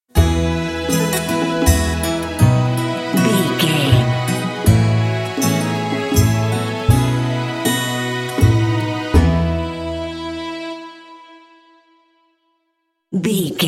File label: peaceful shire bumper